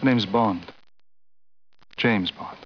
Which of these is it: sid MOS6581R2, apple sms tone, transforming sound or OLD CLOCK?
apple sms tone